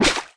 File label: Fish Damage